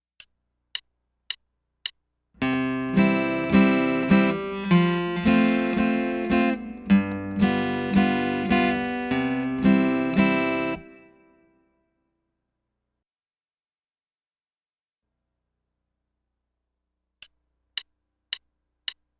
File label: Chords C Accompaniment Alt. In 3 4 Time, guitar